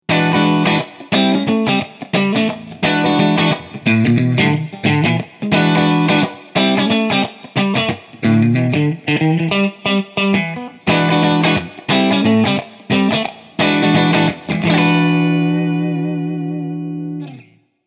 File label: Hi Input Bass Shape; Artisan CH1